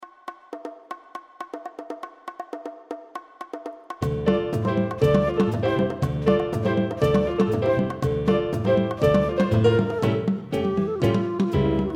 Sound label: vocals